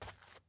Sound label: dirt step